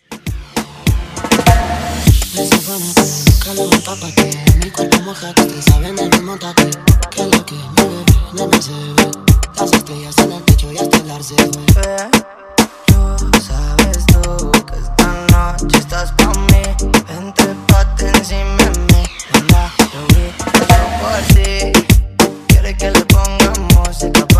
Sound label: Reggaeton Redrum